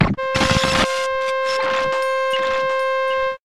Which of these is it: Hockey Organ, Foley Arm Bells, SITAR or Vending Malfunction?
Vending Malfunction